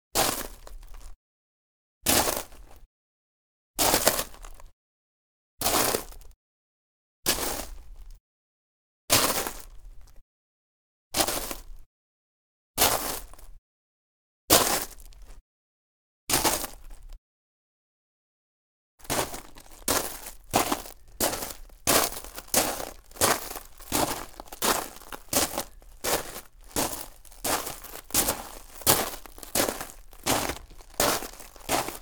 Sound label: Gravel, Foley Footsteps; Sound Ideas